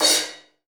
CRASH L, DRUMKIT

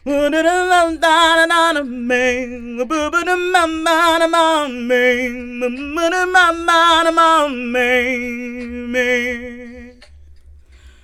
FEM, Hip Hop; E-MU Formula 4000 Series Vol. 1; Hip Hop Nation; Phatt Phemmes 2

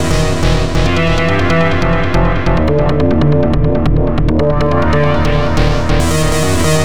NRG Sweep, Trance

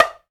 TONG, African Perc; Spectrasonics; Supreme Beats; African Contemporary; Afro DRMs

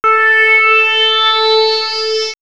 Swirls Sonar, pads; X-Static Goldmine

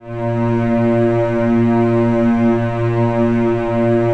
A#1, Strings; ILIO; Kirk Hunter Virtuoso Strings VOL-3; STR TAME

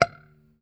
F#6 sus, Strat guitar; Spectrasonics; Hans Zimmer Guitars VOL-1; JC-STRAT MTE

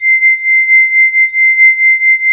SINGGLASS; AKAI S-Series CD-ROM Sound Library VOL-2; W+GLOCK

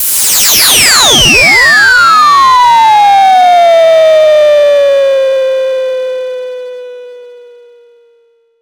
SYNTH FX

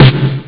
roll; Amiga Soundtracker Sample Packs

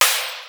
DR-660 DNC, DRUMS PERC; Rubber Chicken Software Sample Bank

